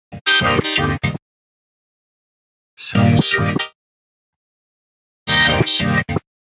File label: VOX